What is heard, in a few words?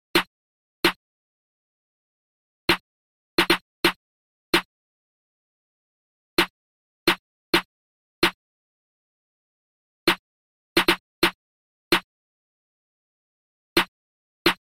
Snare 130bpm